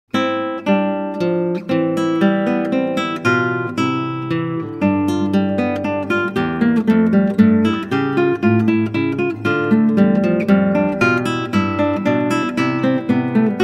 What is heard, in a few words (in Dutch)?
Klassieke Gitaar; Bach 50 Solos